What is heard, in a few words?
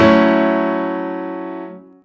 Four F# A3 C# E4; osu